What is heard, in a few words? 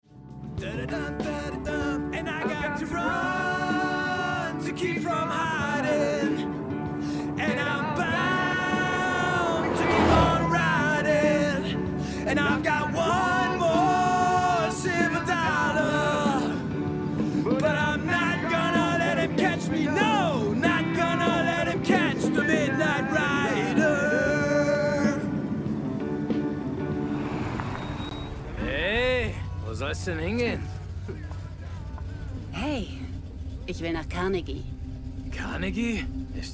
chanting; reservation dogs